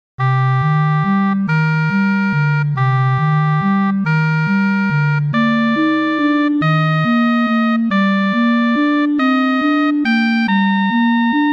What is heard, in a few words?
minor subminor